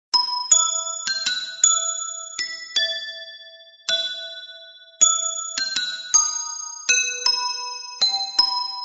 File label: Sms; Harry Potter